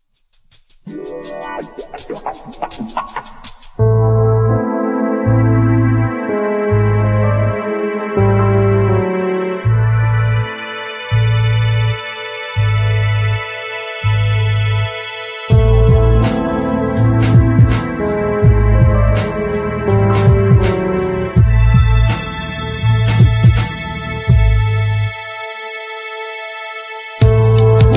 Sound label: Ambient Cities